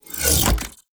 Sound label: Big Slash Unequip